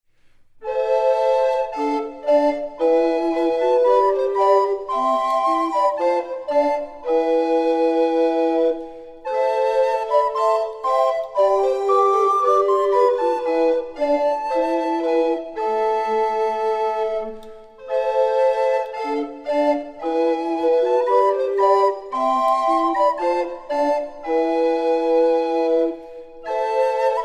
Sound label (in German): Floetenensemble, Blockfloetenensemble; Kirchenmusik